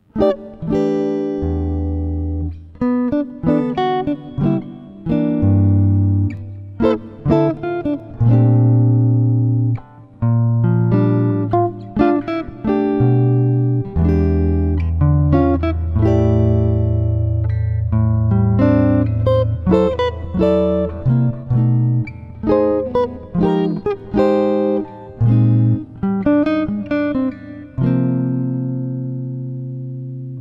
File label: Chord Melody; jazz tunes